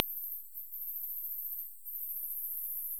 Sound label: Kerivoula papuensis